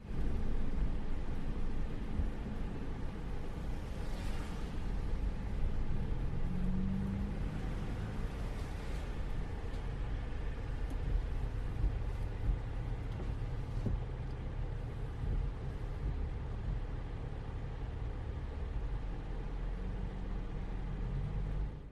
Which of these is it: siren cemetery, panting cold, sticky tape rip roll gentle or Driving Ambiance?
Driving Ambiance